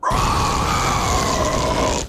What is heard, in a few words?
atomic flounder roar